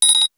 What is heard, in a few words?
wake up tones